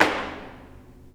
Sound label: woodblock